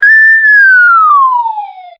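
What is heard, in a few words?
police whistle short burst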